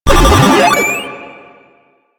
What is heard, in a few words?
Proto Infested Mutalist Speaker End Scream; Warframe; Abilities